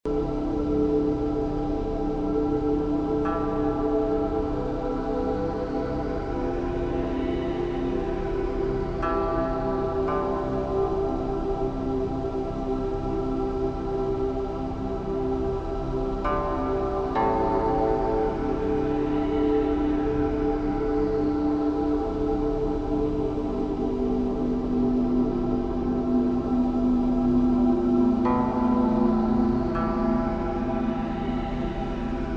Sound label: Amb Sound Scape Japanese Koto; Ethnic; Ambient SoundScapes